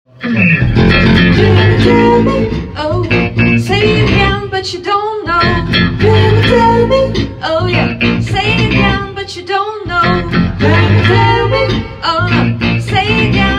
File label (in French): Travail Chant